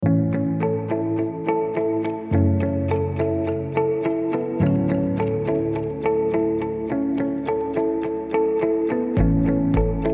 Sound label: piano ambience relax